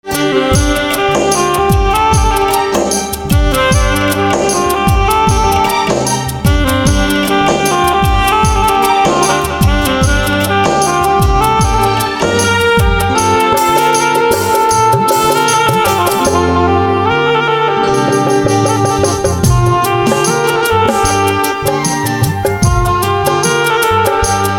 saxophone ringtone

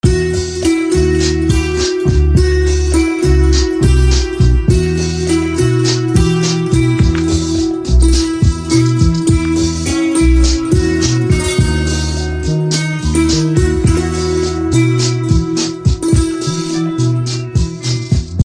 Santoor Instrumental Ringtone; Ek Haseena Thi; BGM

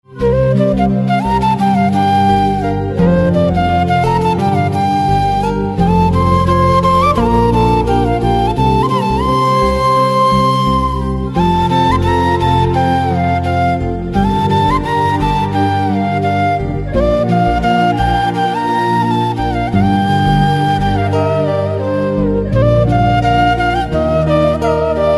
Flute Instrumental Ringtone; south indian instrumental ringtone

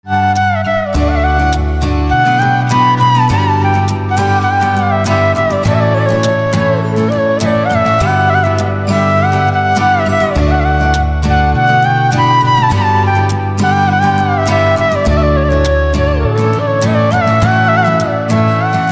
Flute Instrumental Ringtone; punjabi instrumental ringtone

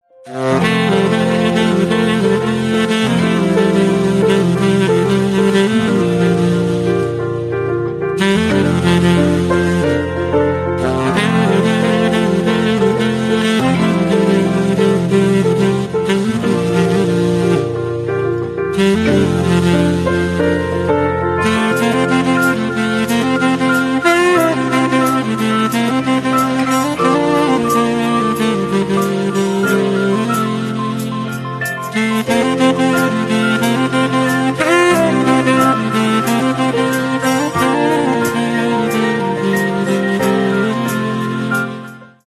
Saxophone

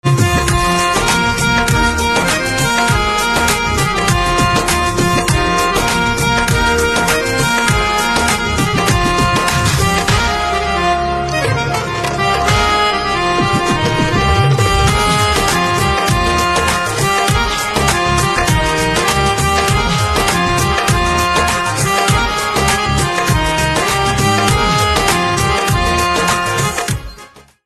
Harmonium Instrumental Ringtone, bhojpuri

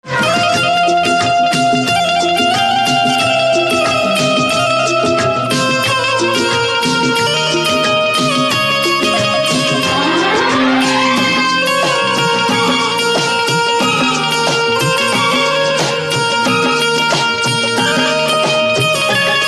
Banjo Instrumental Ringtone

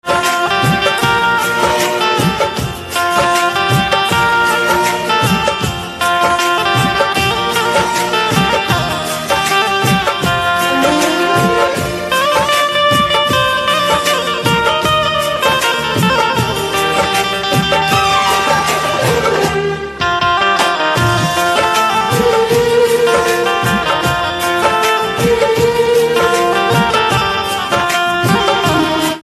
BANJO Ringtone

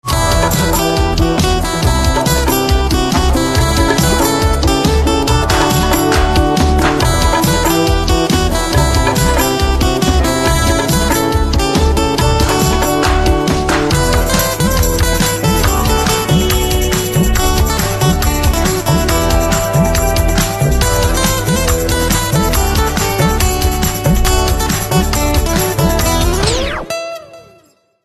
GUITAR Ringtone

